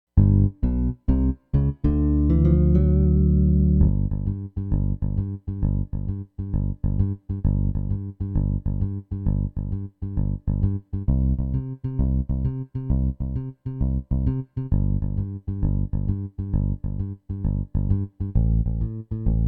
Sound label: Carolina Blues, bass track